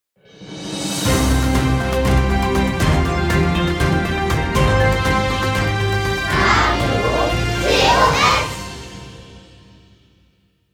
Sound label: Jingle; earsinnig hoeren 2023; rodgau